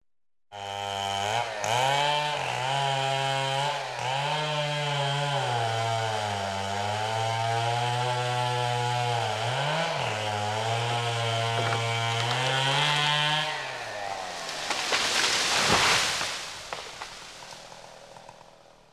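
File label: tree felling sawing the tree falling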